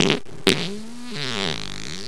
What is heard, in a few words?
fart